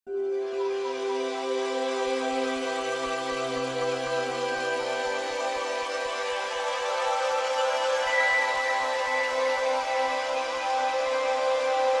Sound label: ambient